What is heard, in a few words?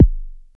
Roland SH-09 Bassdrum